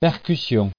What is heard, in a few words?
percussion